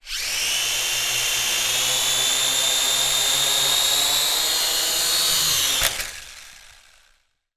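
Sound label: sounds of quadcopter drone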